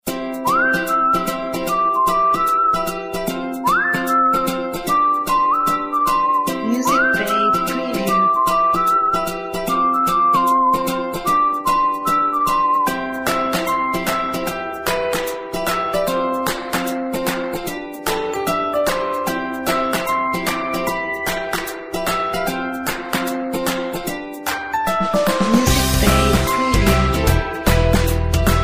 Upbeat Ukulele

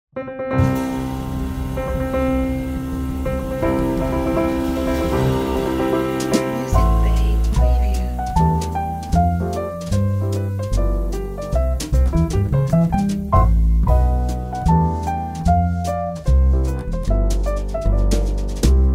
Wedding March

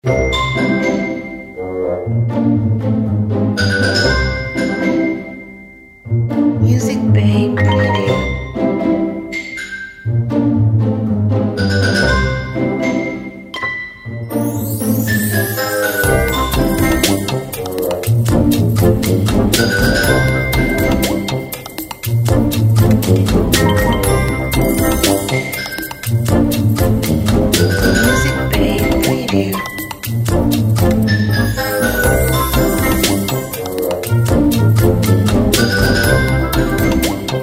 Funny Orchestral Game

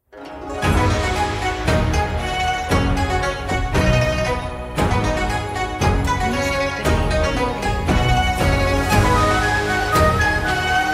Asian Cinematic Uplifting

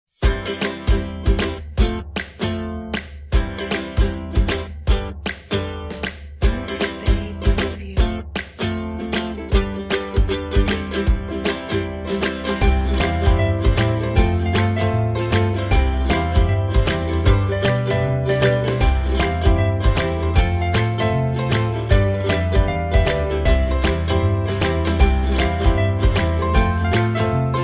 Happy Feeling, ukulele

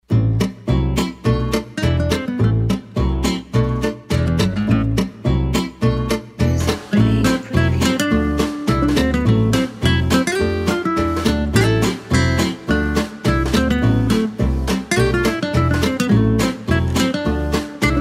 Gypsy Romance

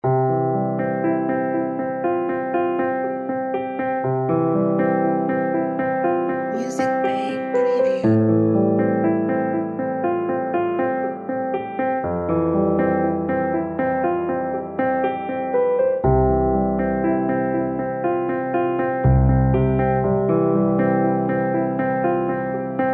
Cinematic Piano

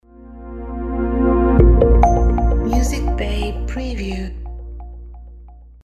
Clean Logo

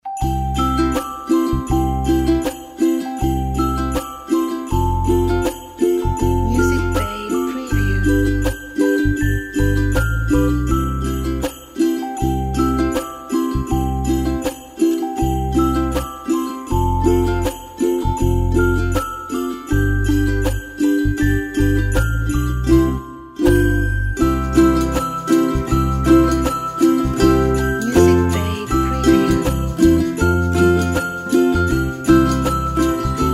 Jinglele Bells

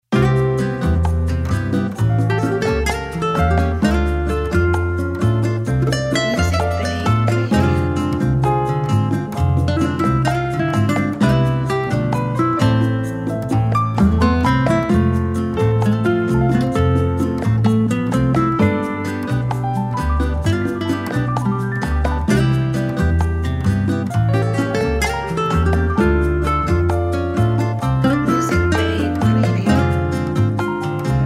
Bossa Nova

